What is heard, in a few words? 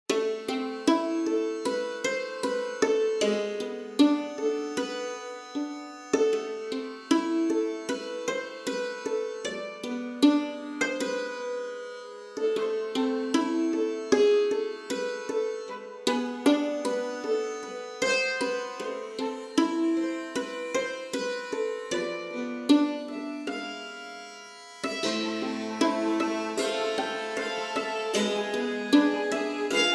dulcimer